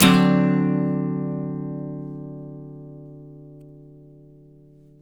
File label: CHORDS, Blues Guitar; ILIO; Fretworks